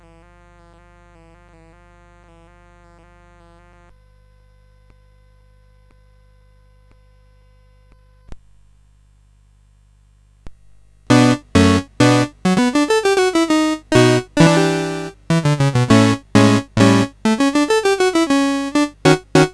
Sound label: Guitar Etude, CSG8580R5